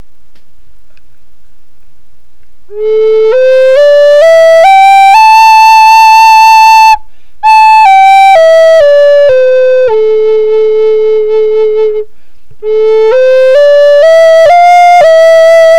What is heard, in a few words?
A 88 bore, flutes